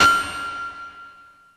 IND; Zero G; Man Machine

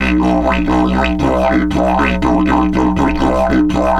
120, Didgeridoo

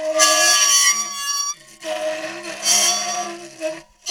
ronk, science fiction; E-MU Producer Series Vol. 3 – Hollywood Sound Effects

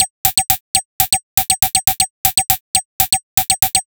Odd Glitch, 120 BPM, Percussion

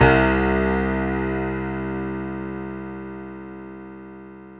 PIA MICRO, MICRO-PIANO, Roland

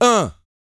GRUNT, MALE HUMAN; Spectrasonics Vocal Planet

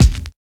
KICK 2; East West - Phat & Phunky CD2; 90's Sample CDs

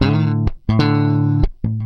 Groove Pluck, Bass; Bass X

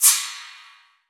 FX CYMB

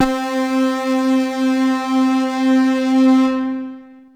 Techno leads, hits and riffs

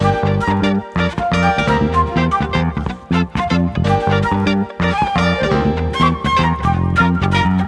backing, Disco; Best Service ProSamples vol.48 - Disco Fever; AKAI

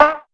TAP TONE, PERC; Crypton; DrumBase5000; PERC.COMBI

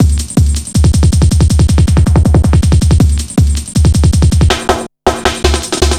160, Drum Loops, Drum & Bass; Total Drum&Bass

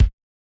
Bass Drum H; Yamaha DJX-2B

